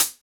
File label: Closed Hat; Ghosthack Advent Calendar 2017 Day 5